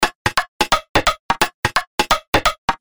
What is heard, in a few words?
Perc Loop 173